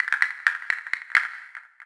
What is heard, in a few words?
castanets; ambient; World of Tanks Blitz; pliego